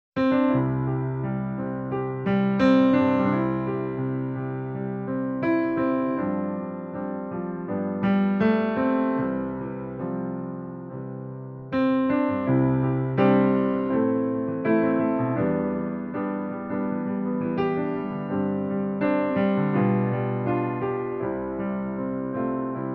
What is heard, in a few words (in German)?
Pianoplayback sängerin C-Dur